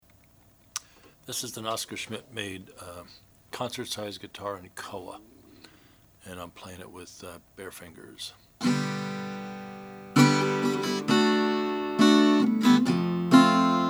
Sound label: oscar schmidt hawaiian guitar koa